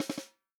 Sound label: snare drum, HIPHOP SNARE CHROMATIC